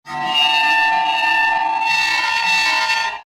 destructive metal scrapes

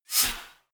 wipe

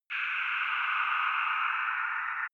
windy dark shuttle

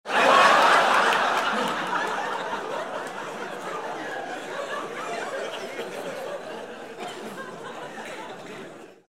laugh long

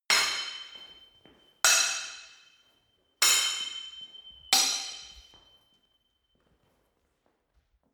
sword hit sword sequence

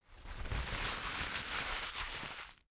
paper towel roll sheet crumple

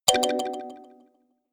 notification kalimba delay minor high

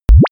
blob sweep

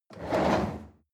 chair wheel roll on floor hard

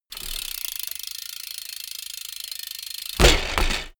bmx bike ramp fly land

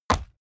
horse step stone

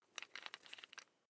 electric drill bit unfasten wrench turn

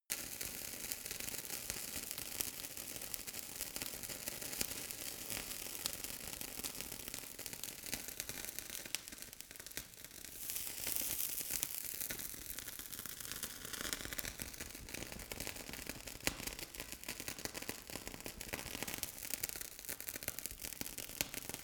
torch small loop

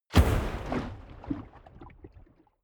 waves hard glug

